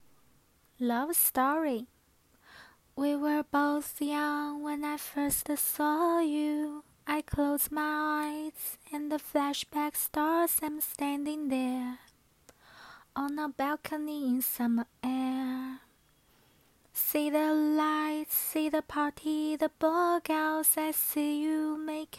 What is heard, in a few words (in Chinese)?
英语歌曲片段